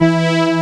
Synth Strings E4; Hexen Demo; Hexen Music; Hexen 6vox

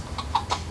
cock

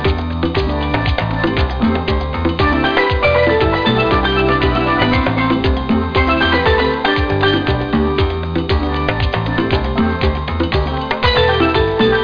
rythm

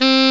Alto Sax; gmplay

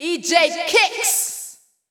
VOICE GRL HOUSE 125 A, House Voice

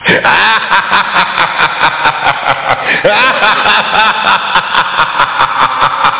laugh

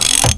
SLIDER UP; mtm2trial

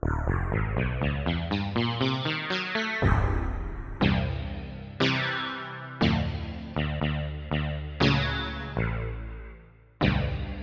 Sitar Bend Up

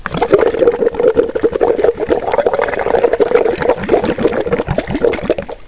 BUBBLE; How Many Bugs in a Box; juicebar

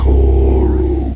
druid spell weak; Nethergate Demo

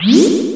Zoom; lomax

